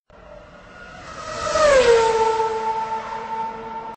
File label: racing